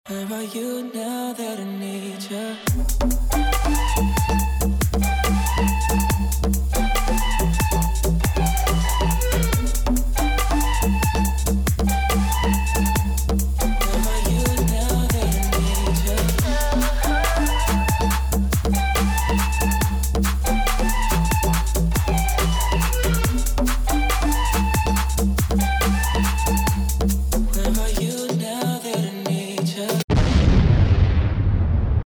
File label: Cheer Tryout Music; Coble MS